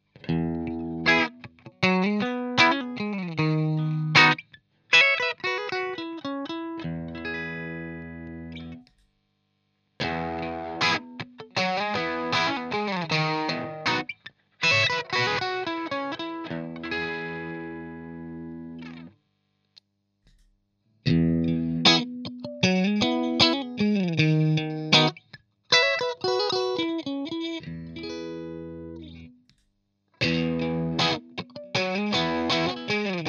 Screamer CH1 negativ 12 Tone 3 Gain all positions Riff; JHS; 9 Gain